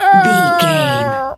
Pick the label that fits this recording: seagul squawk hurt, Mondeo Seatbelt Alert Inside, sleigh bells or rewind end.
seagul squawk hurt